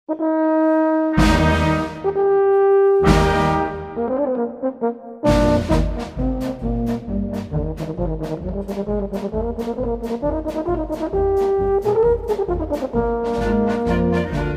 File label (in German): tenorhorn